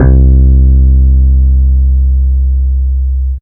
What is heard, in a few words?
Single Electric Basses, Dance Floor Bass